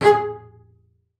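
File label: marcato G#4 left, bass spiccato; Xsample VOL-5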